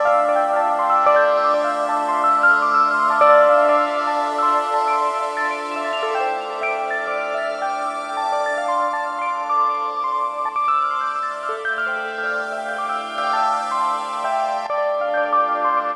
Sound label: Drone Keys; Sonic Foundry (Sony Creative Software); Synchro Funk